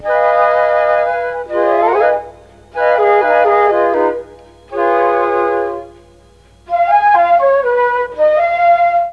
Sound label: G#3 CHA FLT, Mellotron; Mike Pinder - Mellotron Archive CD